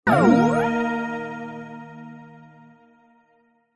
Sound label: multimedia game tone short positive complete